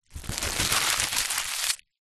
packet plastic crisps multipack empty scrunch up in hands; foley